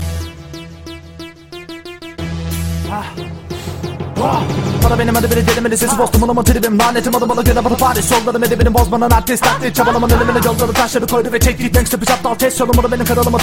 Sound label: speed rap, hip hop ringtone